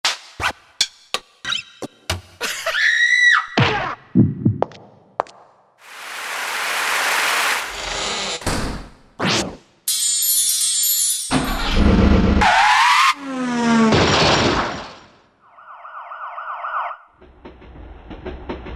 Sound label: SFX, Roland SC-50 Sound Canvas, Factory sounds